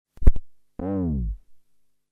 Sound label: Virus KB switch off